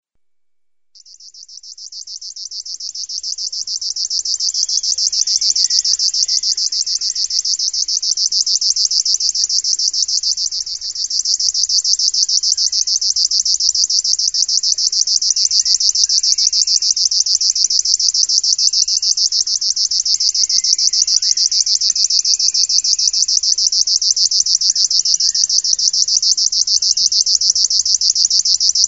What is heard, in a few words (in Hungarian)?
berki tücsökmadár, madárhang